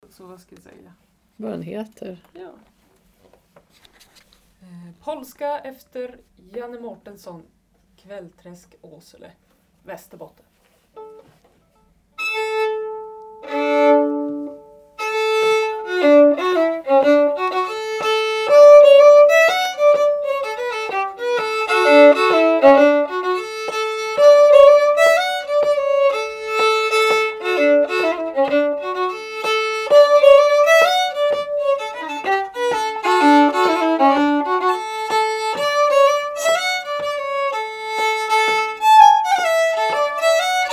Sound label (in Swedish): Polska